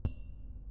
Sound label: Metal pole tapping